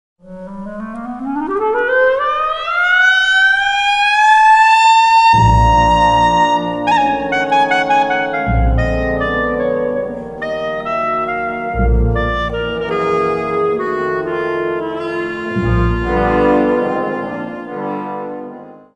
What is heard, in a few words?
Music; Lab 1: Song; Signals Systems Lab